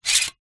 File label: industrial caulk gun metal slide